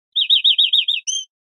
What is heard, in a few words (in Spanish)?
pirreos, verderon